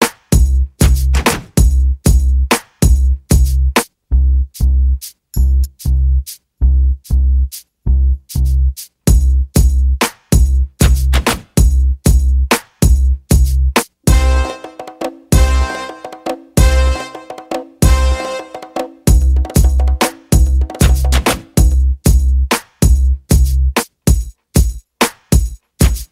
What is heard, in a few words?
Snake Remix Instrumental